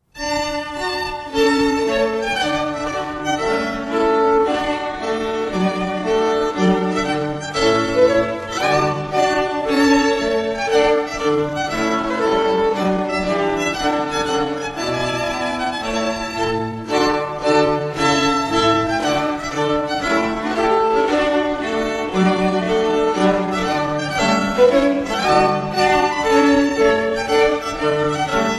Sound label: trumpet tune; Purcell